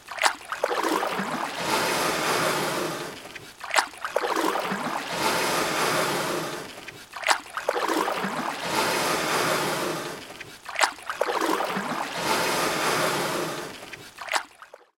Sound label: wading